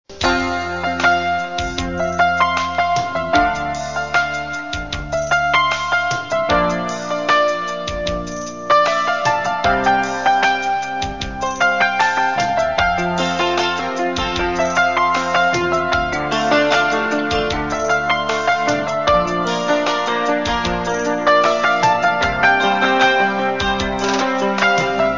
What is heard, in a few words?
ringtone instrumental ringtone romantic ringtone bgm